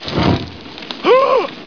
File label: doc gasps